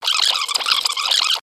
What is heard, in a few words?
Tape Forward Reverse